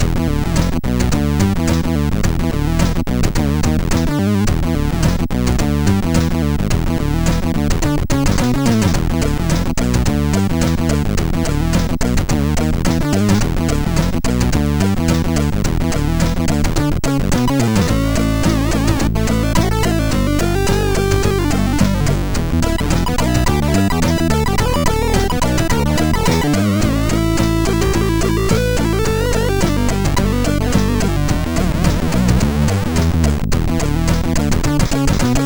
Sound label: Test Heavy, sid MOS6581R4; Driver 14